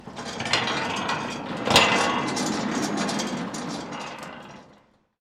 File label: singlegate